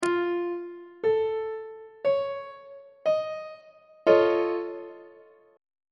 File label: f augmented 7th chord on treble clef